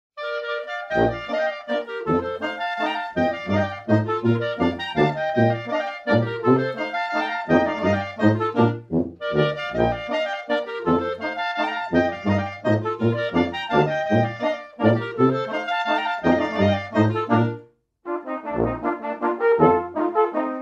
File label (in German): Hochzeitslandler; Da Oaschichtige